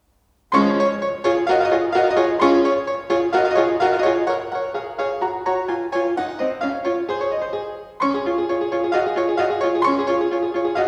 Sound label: Haydn six keyboard works